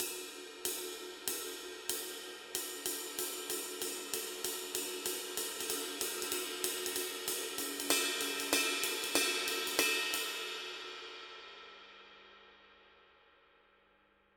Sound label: 20 dark crash pattern, paiste; masters